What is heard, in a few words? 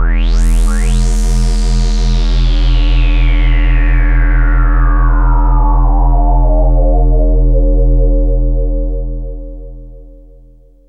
Bass (low filter drone); Sonic Foundry (Sony Creative Software); Ugly Remnants VOL-2; Basstuff